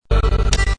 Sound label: dual VCA drone loop; modular; vca dbx